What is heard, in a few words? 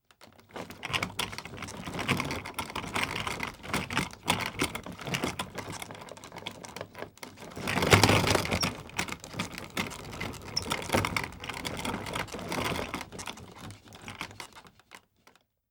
Rickety Cart Movement; Fairlight Sound Lib